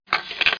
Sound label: slide; Microsoft Graphics Studio; Home Publishing 99